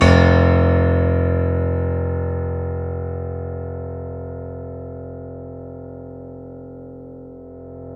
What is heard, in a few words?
KEY, YC7 Piano ff